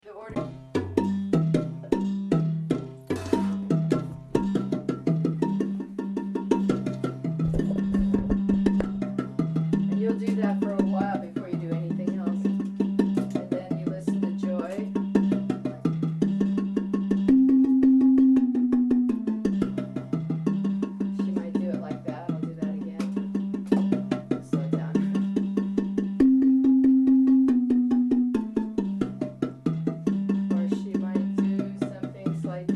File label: baritone, marimba